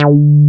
TEK D4, TEK BASS; Drums In Your FACE!

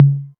808 Tom Mid; Novation DrumstationV1

